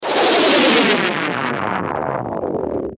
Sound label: Descend Warble Distortion Static; Warble Sound Effects; Blastwave